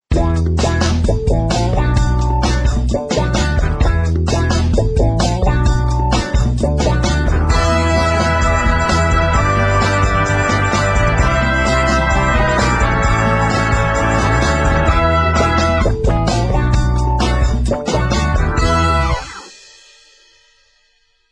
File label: motown ringtone